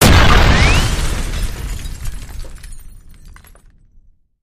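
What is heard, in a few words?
Explosion Glass Debris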